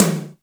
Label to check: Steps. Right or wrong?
Wrong